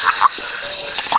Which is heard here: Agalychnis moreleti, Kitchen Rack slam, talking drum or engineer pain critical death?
Agalychnis moreleti